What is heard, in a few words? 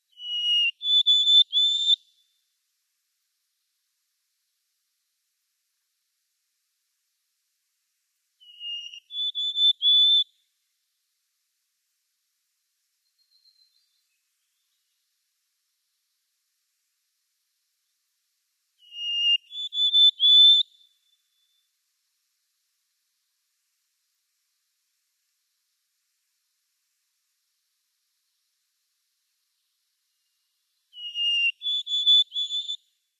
White-throated Sparrow song